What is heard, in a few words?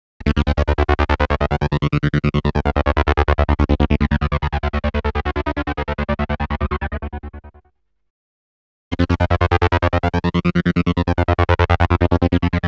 wave seq, rhythmic; Klaus Schulze Collection